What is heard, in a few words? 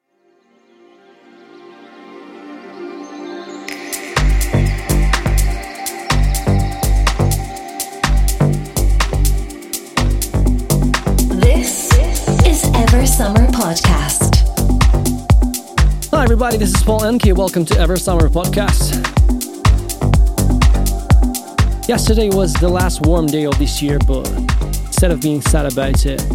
melodic house techno electronica; eversummer podcast